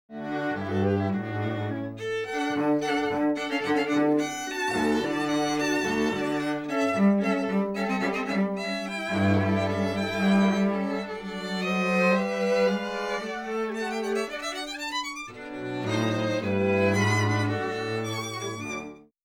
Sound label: String Quartet